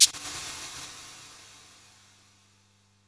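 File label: percussion, 140 BPM, drum & bass; Ueberschall; Drum & Bass Resonance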